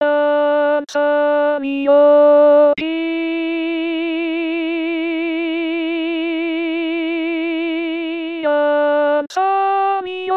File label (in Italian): contralto solo